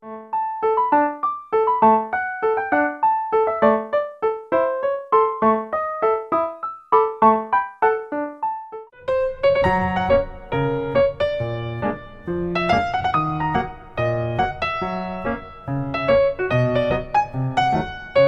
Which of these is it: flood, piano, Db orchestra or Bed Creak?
piano